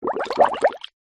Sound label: nature water bubbles small shallow water plastic straw container short; zapsplat